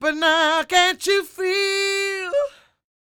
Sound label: croon, Vocal Planet Blues Soul; Spectrasonics